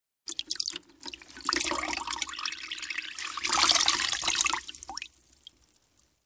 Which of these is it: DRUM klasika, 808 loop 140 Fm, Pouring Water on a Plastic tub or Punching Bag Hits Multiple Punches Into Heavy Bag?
Pouring Water on a Plastic tub